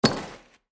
sfx city place

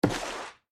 ship place

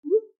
message notification

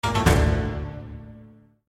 discard notification

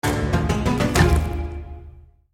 achievement longest road

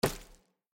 road place

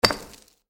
city wall place

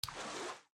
ship move

